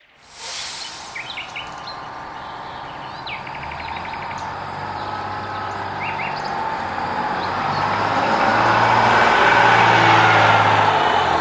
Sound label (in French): vibraphon